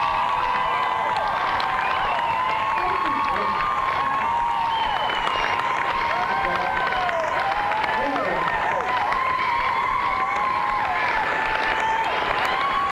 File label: crowd; adelaide, australia